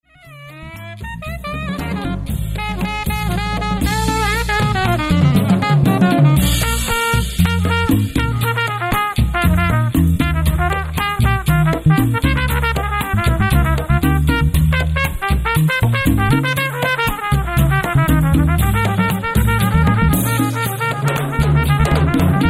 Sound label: Trumpet Solo